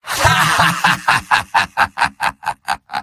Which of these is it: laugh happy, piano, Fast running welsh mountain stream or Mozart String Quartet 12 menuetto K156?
laugh happy